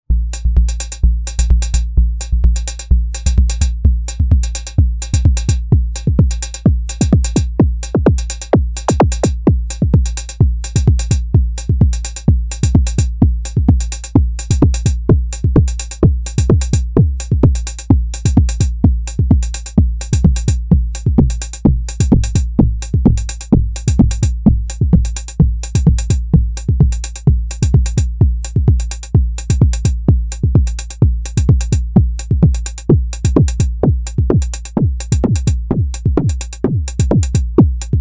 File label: Kick